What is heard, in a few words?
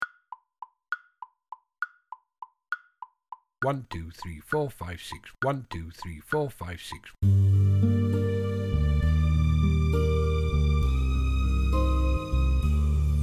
Drifting Full Mix Quavers, drum kit